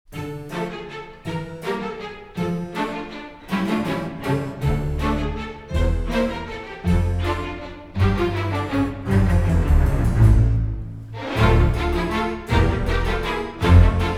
harpsichord

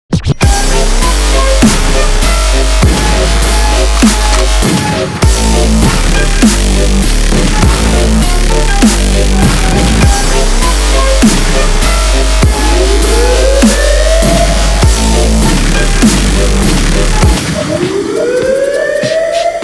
dubstep

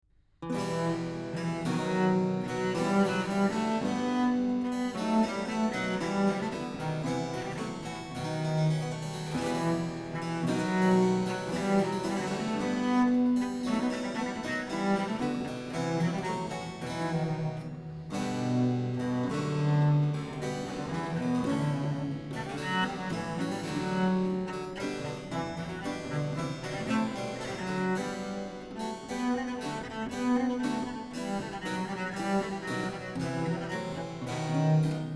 air instrumental; henry purcell

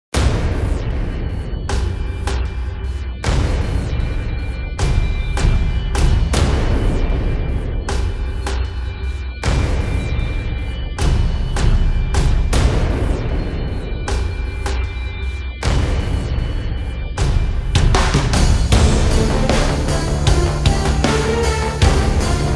remix no choir

